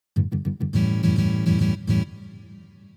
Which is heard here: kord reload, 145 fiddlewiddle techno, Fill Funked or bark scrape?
Fill Funked